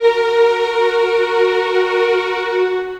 str f#, strings; house; PS-28 Vocal House Samples

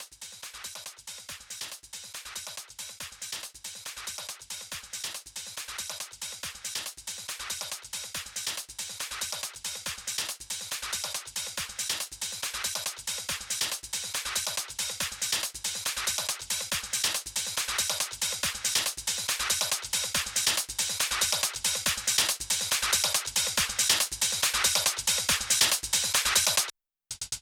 25 Kit 140BPM Loop Fill in; Drums & Perc; Vengeance Samples; D minor

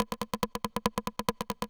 Loop 140BPM, Drums & Perc; Vengeance Samples; D minor

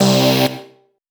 Synth Cuts; Vengeance Samples; VES1 Instruments

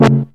Synth Sound; Synth Shots; Vengeance Samples

